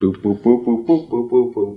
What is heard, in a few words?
boop boop boop boop boop boop boop boop, Voice; ooh ahh